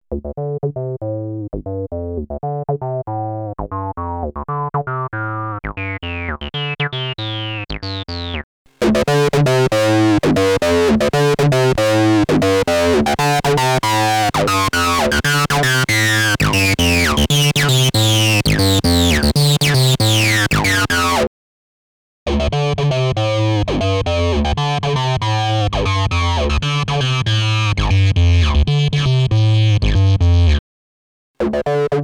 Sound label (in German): Verzerrer 303 diverse